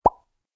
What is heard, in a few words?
magic ready; CityHero